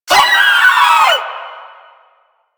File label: Volatile Runner Kamikaze Scream; Enemies; Abilities; Warframe; Proto Infested